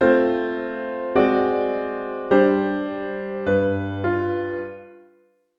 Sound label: harmonic tension